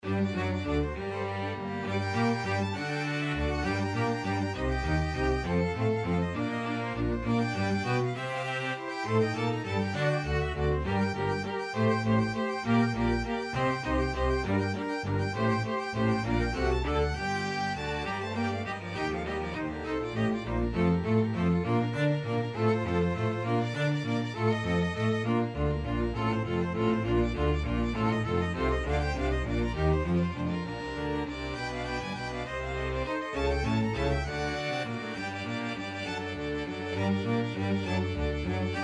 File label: sonata, string ensemble; eccles